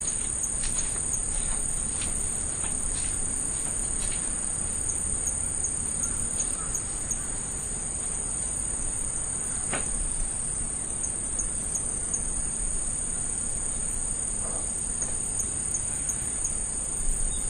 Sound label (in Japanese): kanetataki